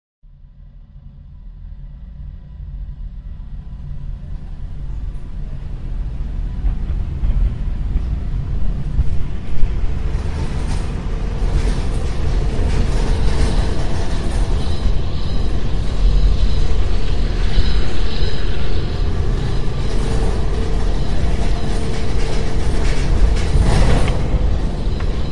birds

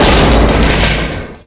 hit; pod skyrace

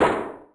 menu prev

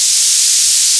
fuse

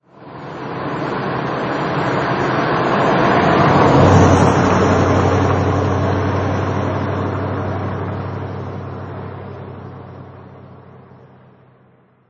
rattler

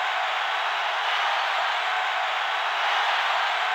animal

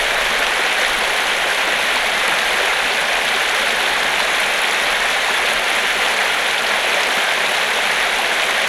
stream; io tracks bonus; morso

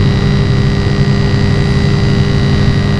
electric hum; rvgl dcpack; roof

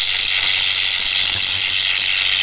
electro zap

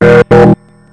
countdown; pod skyrace

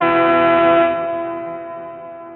countdown; waterfall

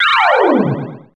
honka

